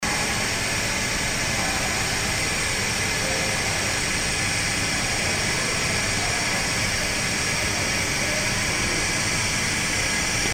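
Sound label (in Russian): звук производства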